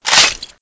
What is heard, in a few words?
bolt forward, weapons, browning; nzp